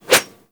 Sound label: Grineer Manic Claw Swipe